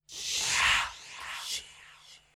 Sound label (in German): akzent schsch